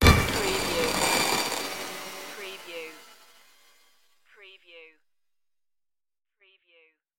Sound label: abstract digital morph